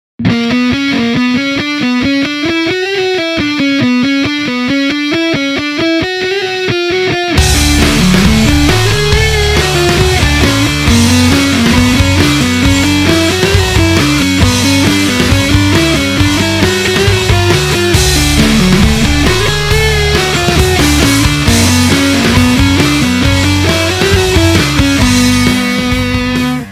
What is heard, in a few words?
riff